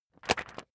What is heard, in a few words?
magazine skim bright